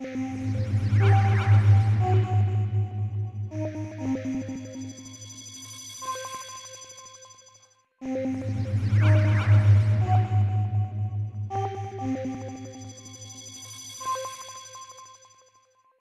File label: Fridge Music Melody